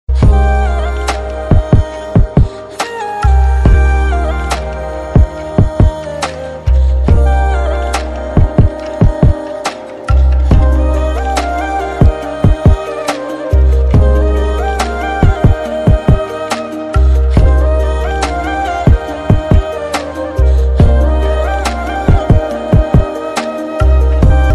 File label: Nepali pan ringtone heart touching ringtone